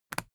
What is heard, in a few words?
briefcase plastic latch lock unlock unsteady; industrie wirtschaft; bueroarbeit